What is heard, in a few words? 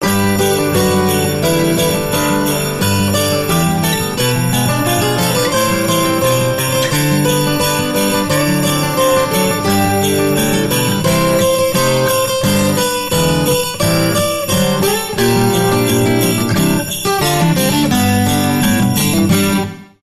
Jingle Bells